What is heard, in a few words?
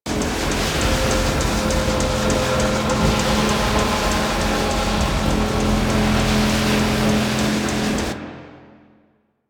Reactor Loop, Ship; Ambience; Props; Warframe; Corpus Ship Remaster